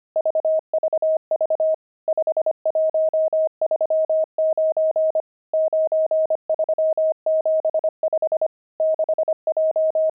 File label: pangram, intermediate pangrams files